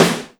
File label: Snare Soft